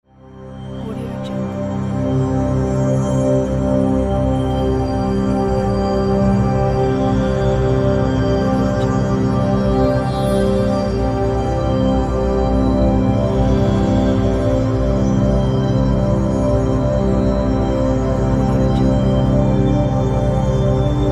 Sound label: mystic drone